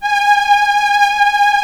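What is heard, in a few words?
strings F#4, Prophet 5; Greytsounds VOL-1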